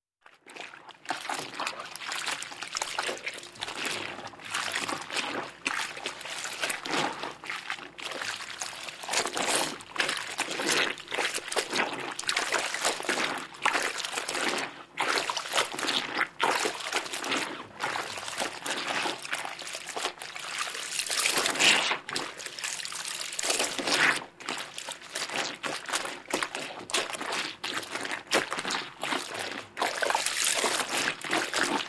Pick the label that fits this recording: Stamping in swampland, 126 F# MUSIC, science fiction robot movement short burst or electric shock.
Stamping in swampland